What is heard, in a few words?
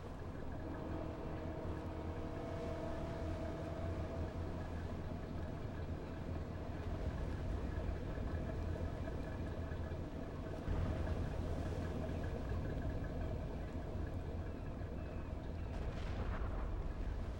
axis camp; WAR